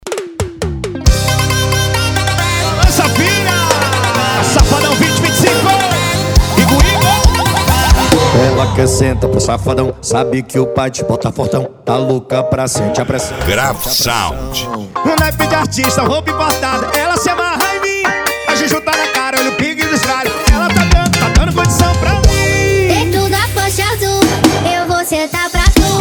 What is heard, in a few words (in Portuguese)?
especial sertanejo; graf sound